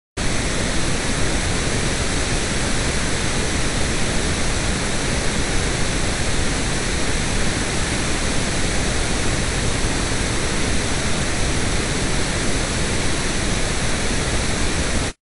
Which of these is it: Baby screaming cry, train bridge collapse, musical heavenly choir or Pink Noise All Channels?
Pink Noise All Channels